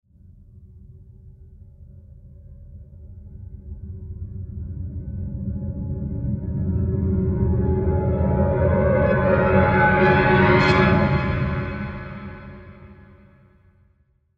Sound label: fearish flanger